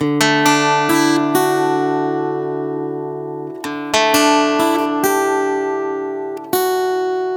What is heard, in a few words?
Guitar - 130 BPM (G Major), Acoustic Guitar